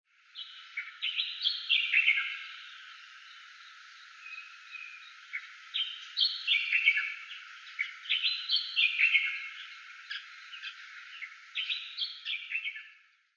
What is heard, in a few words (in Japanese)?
ezosen, muroran, koe